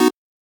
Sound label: Chord